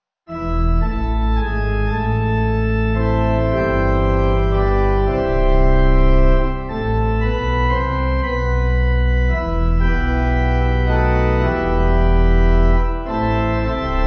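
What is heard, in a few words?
pipe organ hymn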